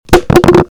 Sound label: Microphone Falling On The Floor; lolicore